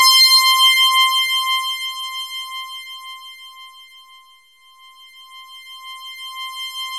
SINN LEAD, MORPHEUS; Syntec; Wall of Sounds

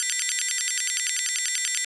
Sci-Fi

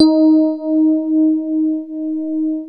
DX ROADZ SFT, FM Paired Tines; E-MU Producer Series Vol. 2 – More Studio Essentials; Keyboards

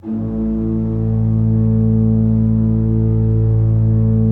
A1, Cathedral Organ; Propeller Island

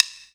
BLOCK; jazz jackrabbit 2; M8

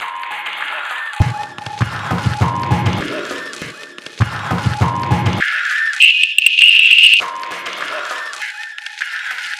Paper Loop; Sonic Foundry (Sony Creative Software); Esoterik Beatz

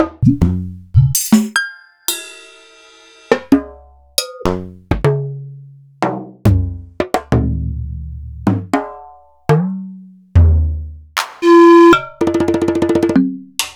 EMU Planet Earth

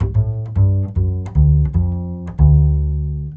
BASS, 150 BPM

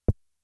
Snare, Drum Machines; Fricke Schlagzwerg

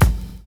WHAA; Univers Sons - Kitch 70s

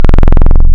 Saw To Soft Clip Sine, wavetables; Animoog

